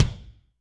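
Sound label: Kick Ambient Loose, Alesis D4; Kik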